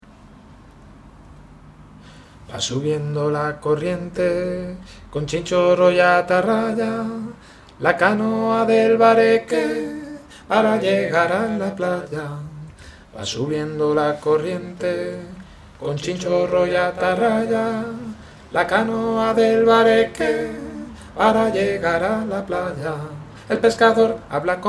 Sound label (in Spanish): el pescador sopranos